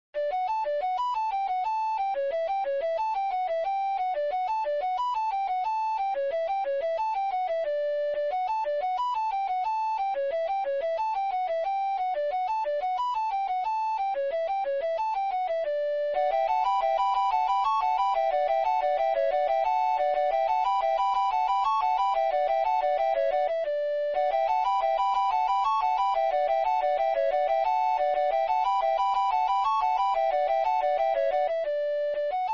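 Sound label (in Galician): muinheira duo, Gaita Galega; muinheira de lardeiros; muineiras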